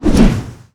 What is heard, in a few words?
Weapon Swing Hammer Slow Heavy; Cielonos; Wwise